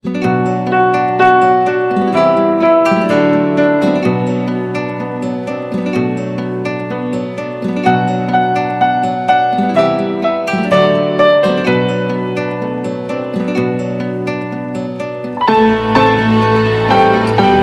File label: Mobile ringtone